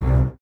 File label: Basses C 2, f Legato; String Ensembles; Miroslav Vitous; 90 s Sample CDs